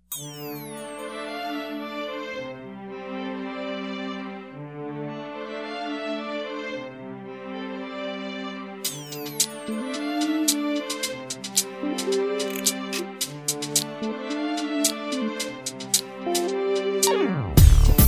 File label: pop